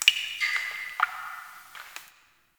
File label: Drip, Ambience; Warframe; TheNewWar; Zariman